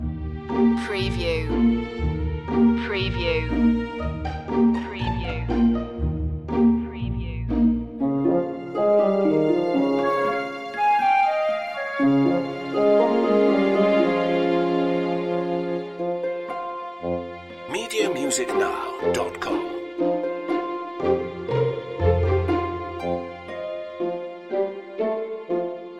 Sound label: country loop